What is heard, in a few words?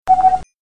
beep